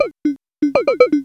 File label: Polyfusion Modular, synths